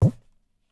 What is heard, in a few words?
tabla; bahia; Dirt-Samples